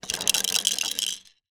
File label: toy plastic disc spin roll